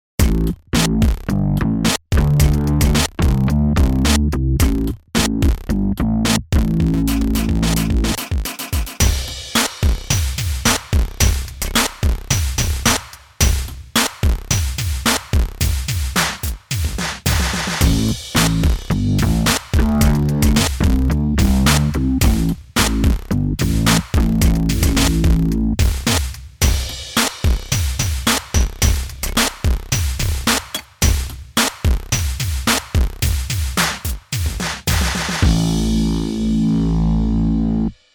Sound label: food karaoke